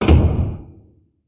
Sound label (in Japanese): ドア閉